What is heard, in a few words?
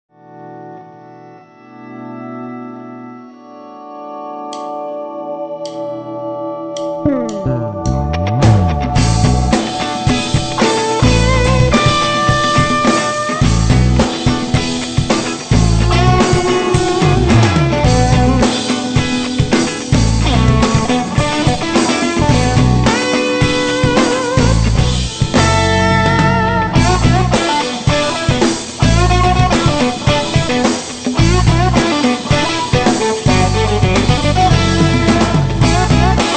b funk